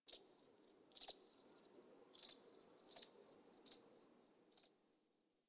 Insect Night

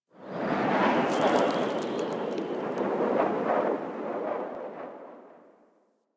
Eidolon Wind Gust Medium, Ambience Plains; Warframe

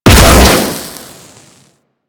Auto Crossbow Fire Charged; Warframe; Tenno

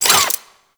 Necramech Repair Drone Idle Short; Warframe

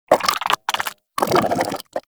Vocal Idle, Shrimp Lantern, Creatures; Baliq

